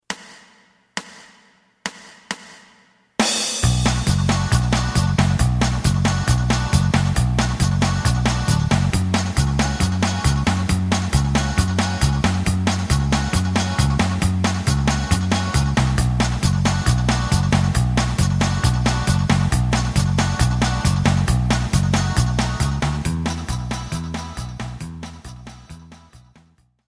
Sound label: guitar lead